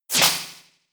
Scratch; attack moves; Pokemon; Gen 4; DPPL HG SS

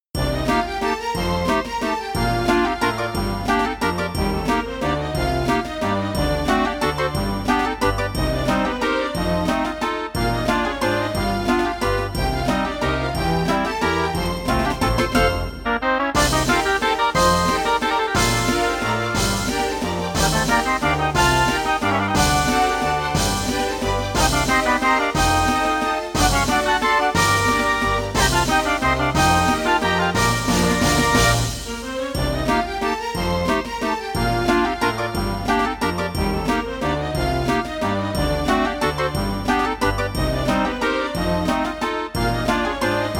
Waltz; RPG Maker 2003

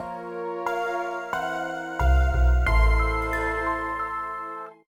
(Jingle) Boss Door

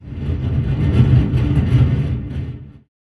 random pipe; devour

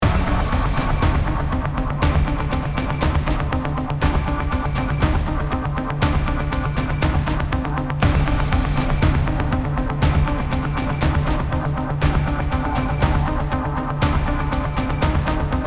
Machinery Music Stress

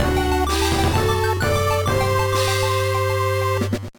Victory Fanfare; one piece going baseball kaizoku yakyuu

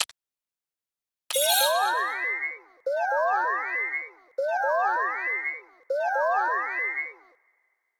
aura loop

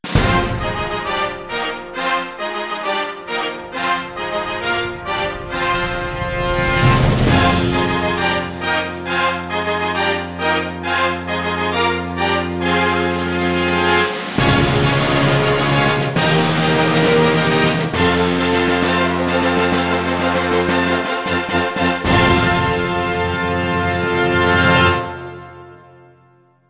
Fanfare Kanto; Champion Jockey: G1 Jockey & Gallop Racer; Xbox 360

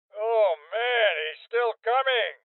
Heavy Hit Male Old

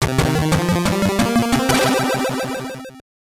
Race Over; Supercross Freestyle 2000; GBC

